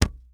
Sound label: Bow Release, Game Weapons; Military; Game Essentials